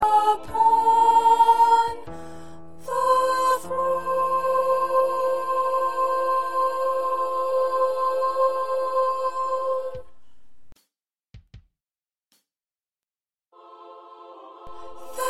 2nd Soprano

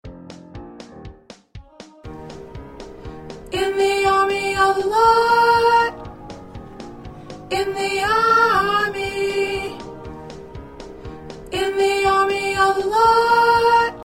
Alto

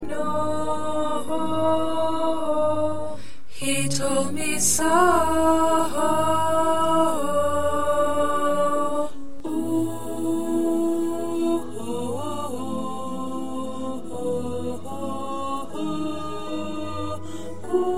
Mezzo

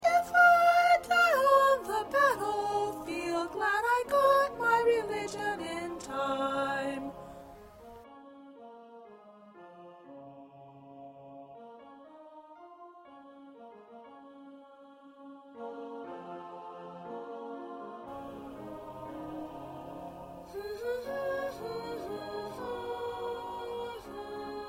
2nd Soprano

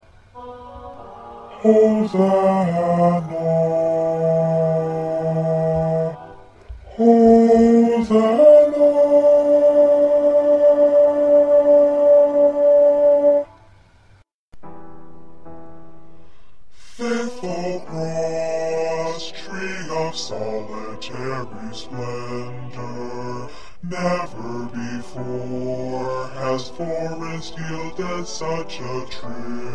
2nd Bass

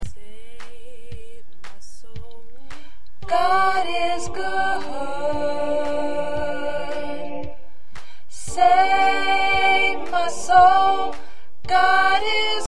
Soprano, choir

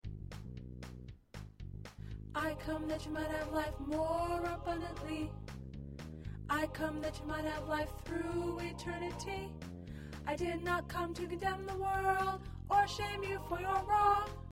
Alto

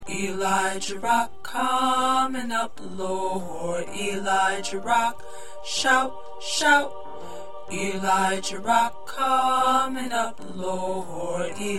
Tenor

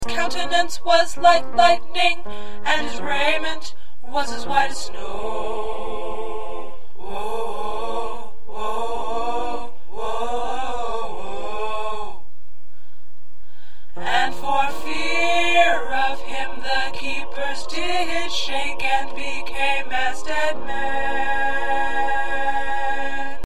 Tenor, choir

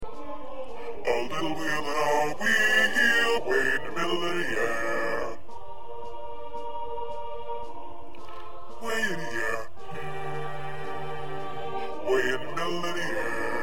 1st Bass

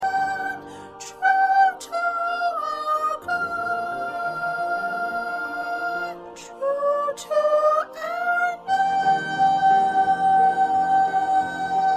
2nd Soprano, choir

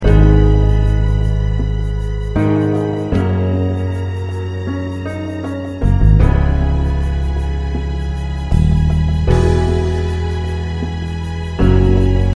Accompaniment

